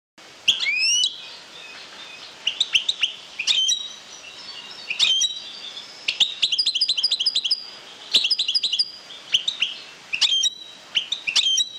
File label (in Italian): cardellino, canto